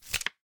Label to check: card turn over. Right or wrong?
Right